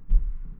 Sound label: footstep upstairs; Project Zomboid v41.73